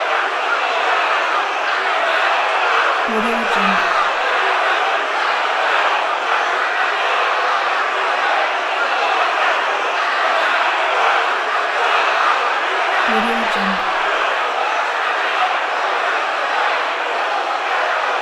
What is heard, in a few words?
walla mob fight; prosoundfx